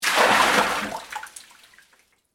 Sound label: Water Pour Into Tub; Pouring; Sound Effects:Objects; BlastWave